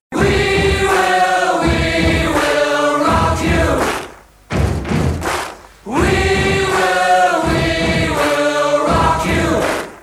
quaternario; esercizio; Sonora vol A

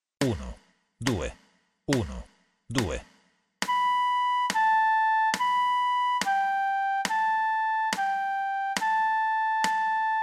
esercizio training melodico

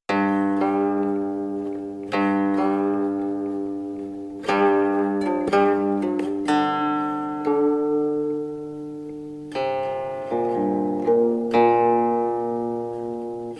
khin

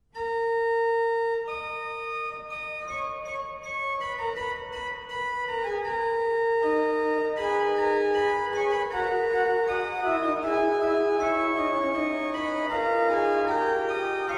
organo moderno

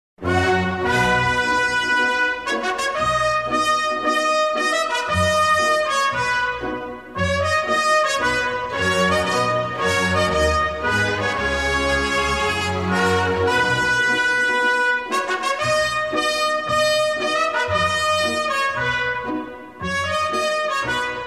marcia; esercizio; Sonora vol A

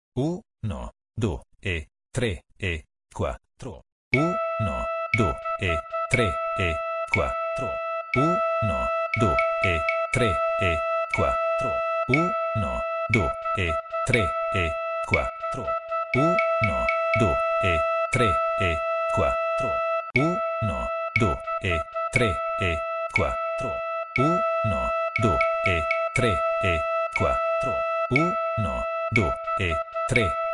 ritmo quaternario semicrome; esercizio; Sonora vol A

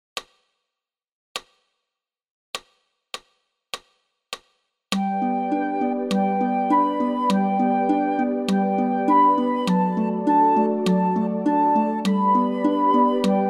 Tre note

